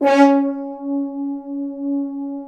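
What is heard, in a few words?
brass, FHns Accent; Roland L-CD702; Accent-Swell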